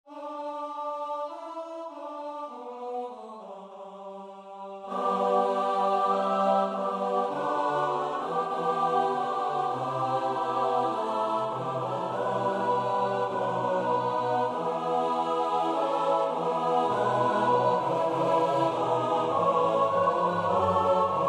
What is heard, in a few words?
midi; nowowiejski; msza polska bogu rodzica sanctus